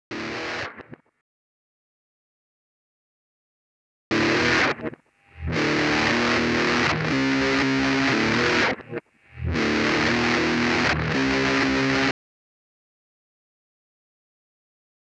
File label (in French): guitare satu